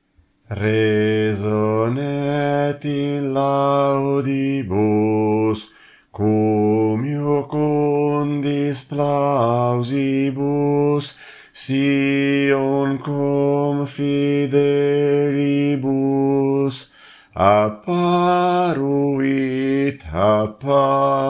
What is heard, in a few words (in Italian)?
Resonet in laudibus contralti; Natale